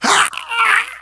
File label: choke, survivor voice; manager; Left 4 Dead 2; left4dead2 dlc3